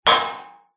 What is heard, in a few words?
impact metal panel or pole hit small hard object resonate